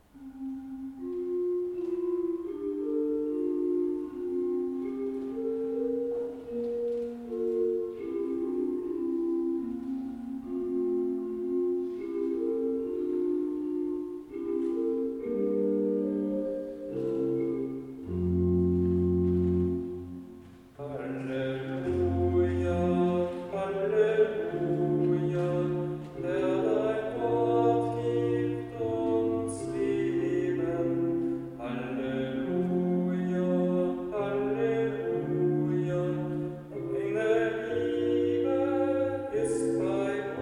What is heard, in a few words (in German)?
Halleluja, orgel; orgel lieder